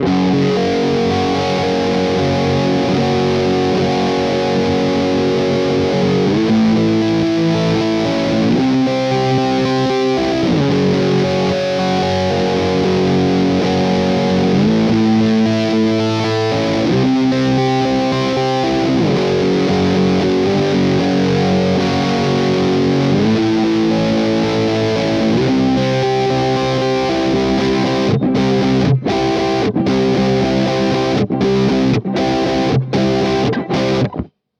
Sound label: Cort KX 500 Both Transparenz